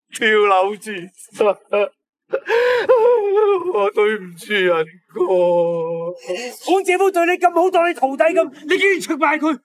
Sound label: old man cry